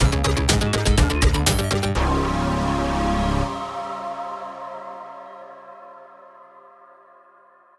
lose stinger; Major malfunction

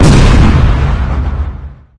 Weapons Rocket Launcher

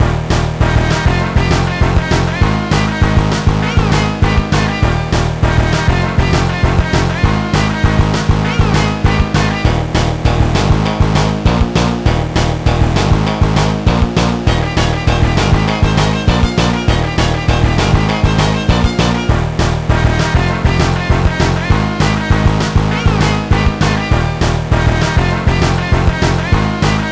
Play the Guitar

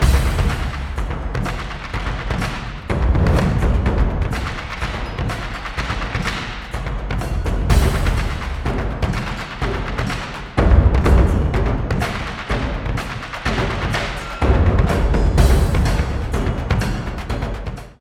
Combat Drums

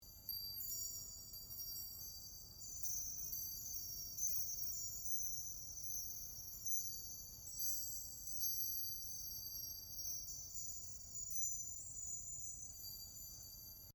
Floating Symbol Ambience

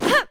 Jump vox

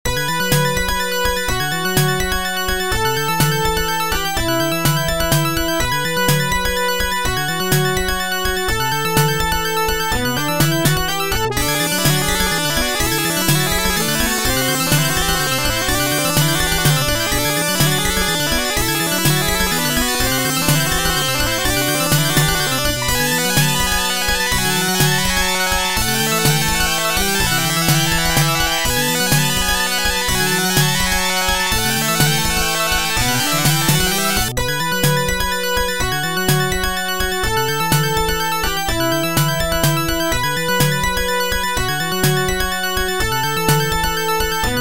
Bonus; columns ii system c 2 arcade

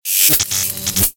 Tesla Shock; Gameplay Wpn; Zombie Catchers; android ios mobile gamerip

